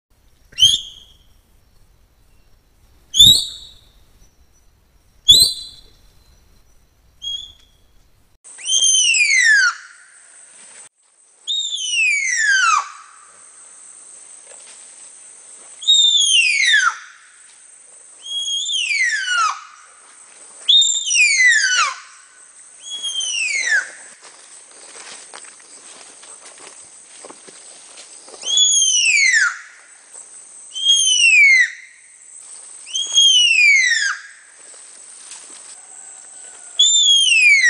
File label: Baird's-tapir, حیوان تاپیر بیرد